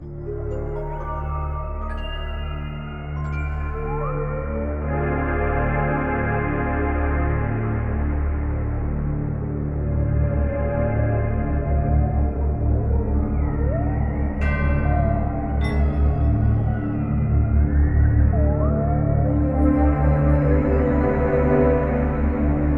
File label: creepy ambient; Spiral Knights